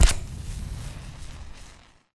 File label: Flare Impact; grabpack upgraded; pp3